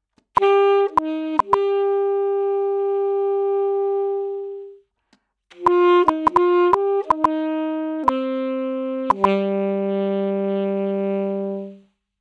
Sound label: sax